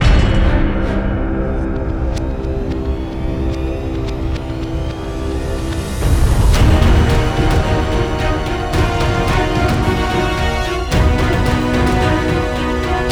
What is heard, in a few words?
Survival Countdown